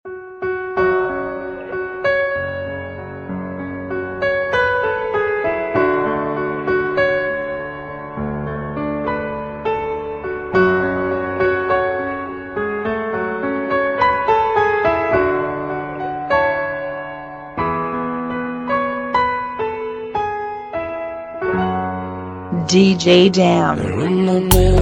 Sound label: Bachata